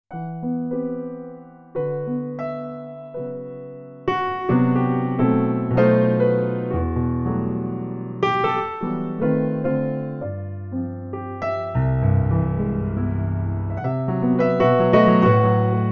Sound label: yamaha c7 close